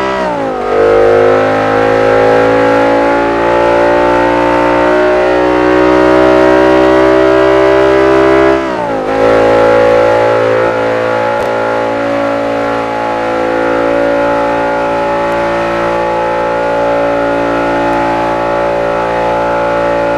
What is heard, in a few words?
fourth cruise, vehicles; bmwm5e60